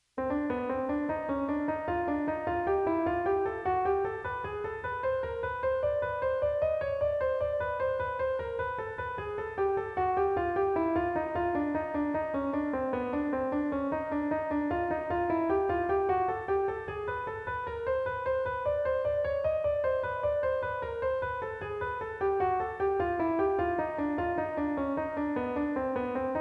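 Melodic Minor Scale Tone Embellishment, jazz piano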